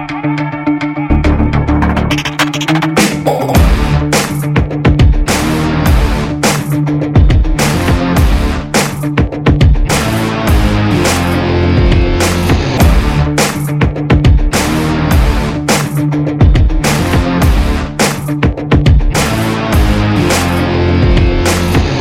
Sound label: Theme Music Ringtone; Cameraman Gangatho Rambabu; Telugu